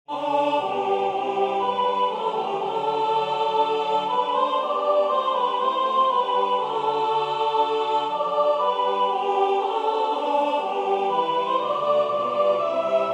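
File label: Hymne Soprano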